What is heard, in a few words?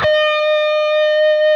PRS E, PRS LEAD, Guitars